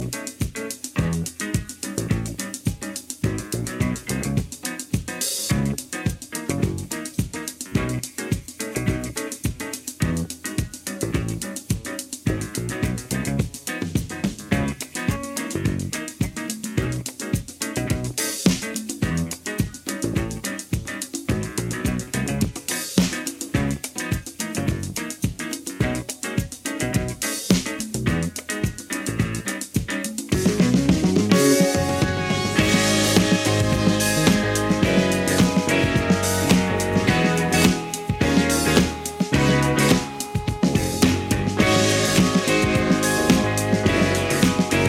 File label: original instrumental